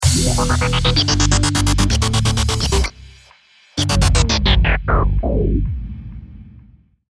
lost round, music

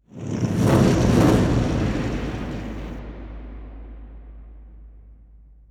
ambient fireball flyby; Portal 2

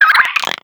headcrab elevator curious; headcrab classic, scripted; creatures

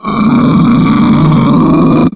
alert, zombie poison; Half Life 2

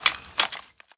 suit, footsteps

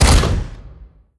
step backup foot, dog; npc; scripted

